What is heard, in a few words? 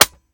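weapon generic fire first, triggers; foley; gmod; viper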